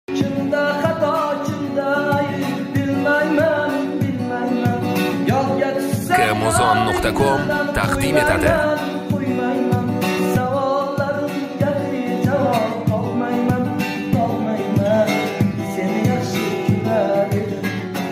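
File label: guitar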